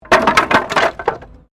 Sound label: Wood Fall